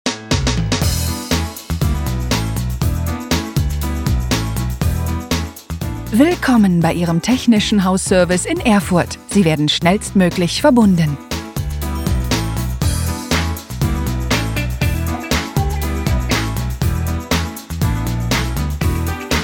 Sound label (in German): Warteschleife; THS GmbH